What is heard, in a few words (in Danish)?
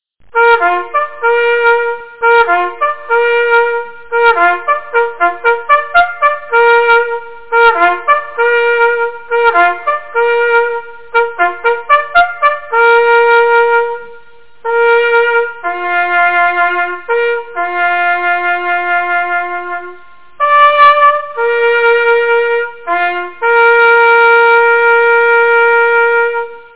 skovduen, jagthorn